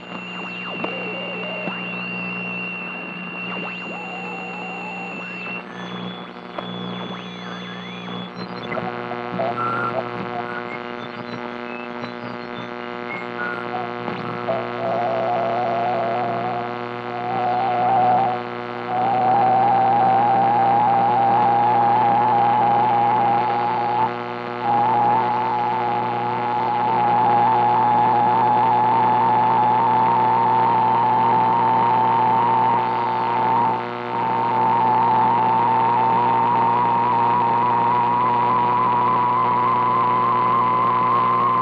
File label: Futz Radio: Frequency Modulations And Static Interference; Mono